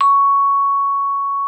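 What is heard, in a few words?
vibraphone C#6; Xsample VOL-4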